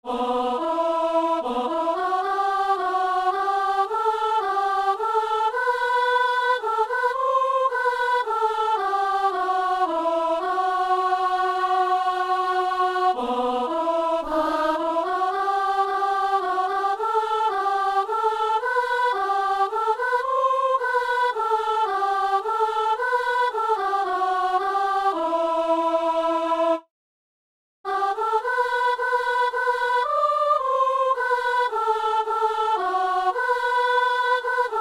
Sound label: soprano